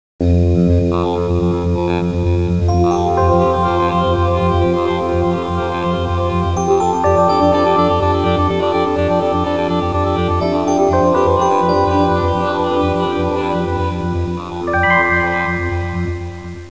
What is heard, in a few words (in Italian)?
musica tecno; techno